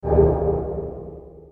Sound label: Item Pickup